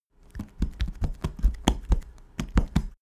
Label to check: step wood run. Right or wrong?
Right